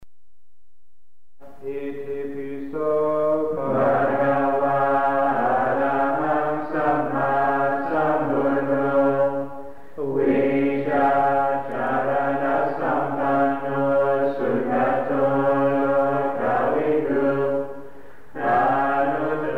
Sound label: chanting; Theravada Buddhism; Buddhanet